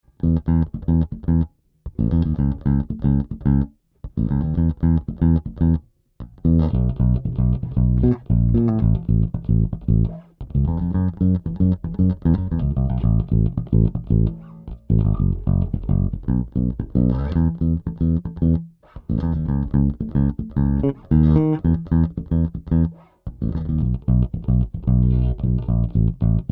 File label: L-2500 Finger